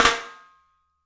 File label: MARCHIN ROL; 3D Sound; 3D Drums